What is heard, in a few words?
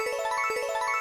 count Up Loop; colossal pinatas